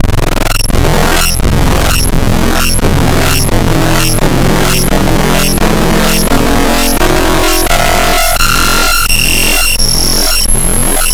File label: Modulo FM, wavetables; Architecture 32